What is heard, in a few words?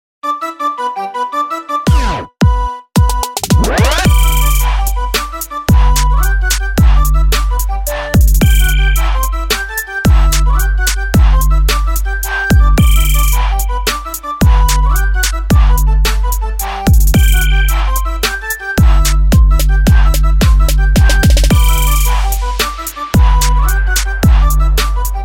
Trap Remix; Spongebob Squarepants